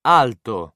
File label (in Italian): alto